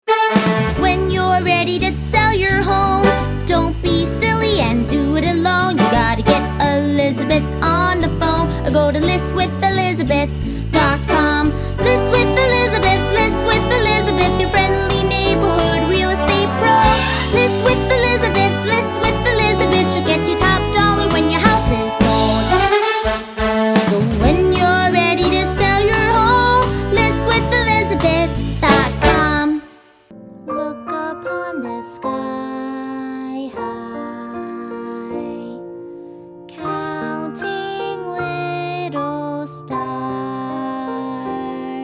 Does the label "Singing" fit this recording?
Yes